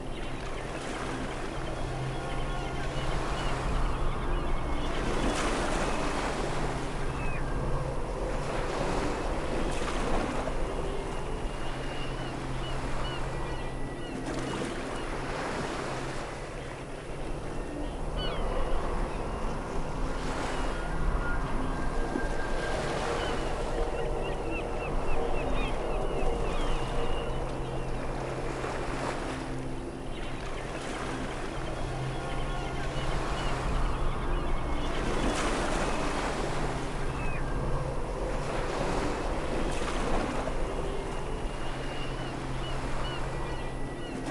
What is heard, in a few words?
Ambient The Lagoon; rayman arena